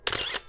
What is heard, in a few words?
Cheers